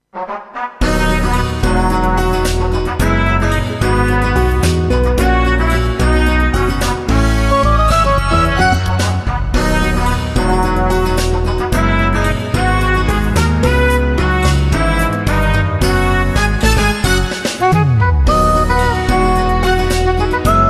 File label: BGM Pop Fusion; Magic Sudoku Numpla Oekaki Puzzle; psp